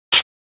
Air compressor machine hissing air release

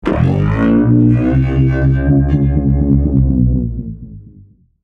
Electric guitar bass drone effect

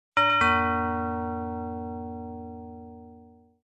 Doorbell ring villa

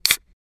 Opening soda can

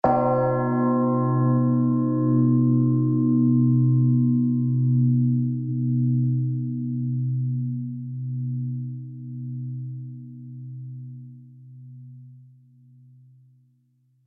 Death bell toll ring funeral grave; large bell

